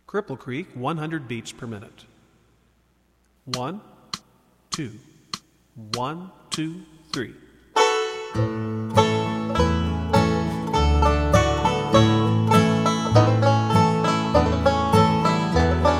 100bpm Harmony Part, banjo; Cripple Creek

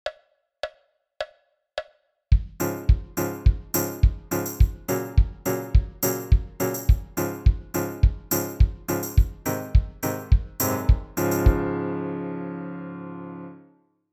example of plain timing, banjo

